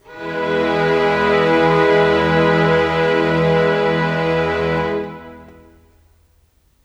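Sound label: Pad E major, Orchestral; Sonic Foundry (Sony Creative Software); Orchestral Series VOL-4 - Rock and Pop; Pads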